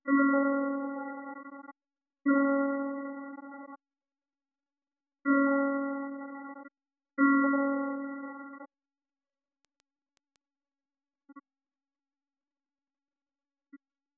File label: piano 1 layer